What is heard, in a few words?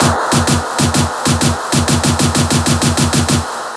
128BPM Fills (2 bar), EDM; Shockwave; ESSENTIAL EDM FILLS VOL. 1